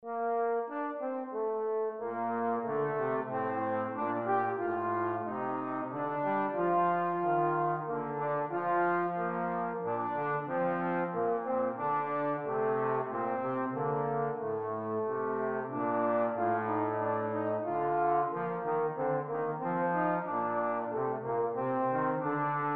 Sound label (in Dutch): Duetten voor trombone